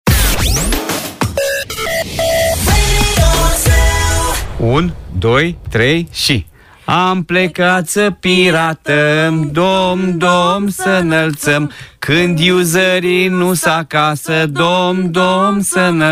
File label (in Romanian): Colind judetul Valcea; colindele pe judete